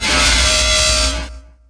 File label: talos hit; DAoC; Toa; Gamestar